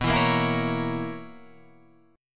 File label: B Major 7th Suspended 2nd Suspended 4th B down, chord shape